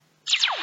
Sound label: laser